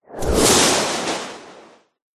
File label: magic dark spell cast powerful wind burst eject; fantasy